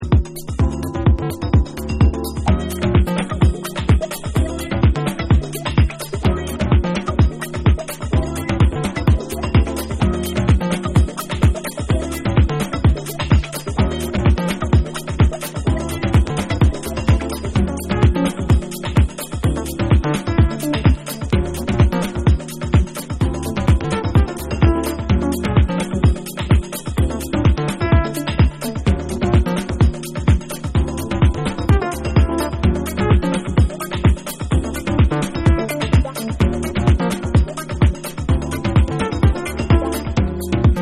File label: Samba Funk Experience Remix